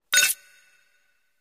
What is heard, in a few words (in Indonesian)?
Suara Notifikasi Pesan, Mobile; Mobile Legends